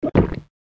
drink; maoudamashii; ゆめ2っき

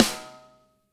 marimba drum; ゆめ2っき

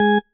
marimba tissue; ゆめ2っき

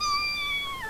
nyaaaaaao

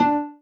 marimba rainbow; ゆめ2っき

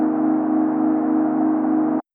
no signal

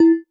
marimba glasses; ゆめ2っき

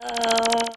runaway; ゆめ2っき

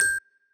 marimba; ゆめ2っき

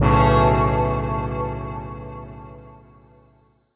chime

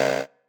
marimba bike; ゆめ2っき

